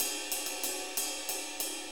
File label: 6-8 Ride, HiHat & Ride Loops